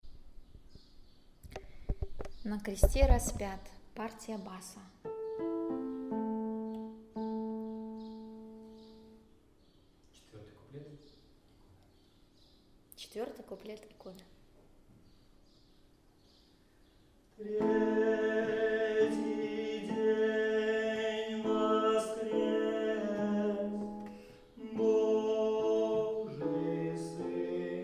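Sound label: bass; Na kreste raspjat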